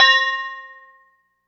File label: CROTALES; CMP - Analogon; RESO